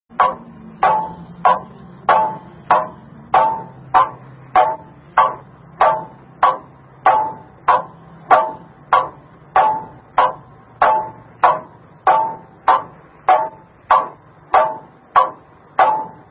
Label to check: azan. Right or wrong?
Right